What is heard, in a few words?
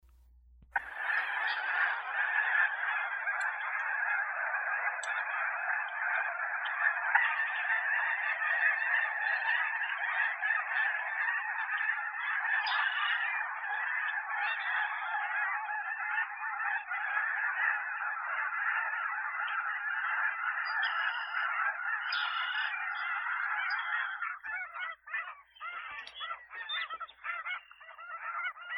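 white goose, Anser caerulescens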